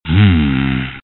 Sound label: hmm